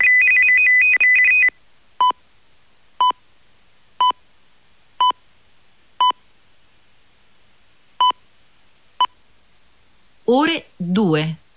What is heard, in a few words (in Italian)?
Leap second segnale orario